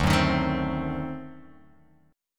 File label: D 7th Flat 9th D down Guitar Open F; D7b9 strummed; JGuitar